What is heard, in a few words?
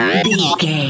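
sci-fi droid robot emote neg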